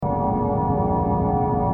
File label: wither projectile